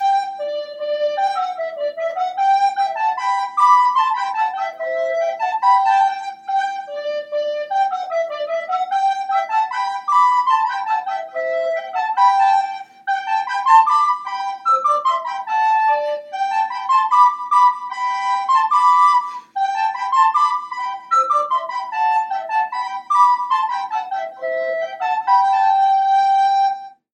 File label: Duet Time Hankerchief Dance